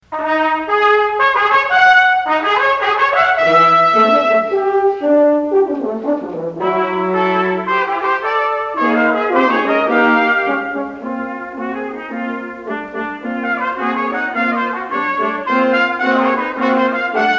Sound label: Suite for Brass and Percussion; Movement 1; Playful